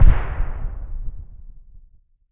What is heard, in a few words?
explosion very far